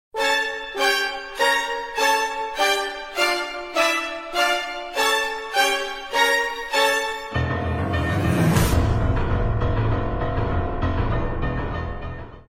Opening Theme

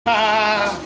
laugh; westbrook

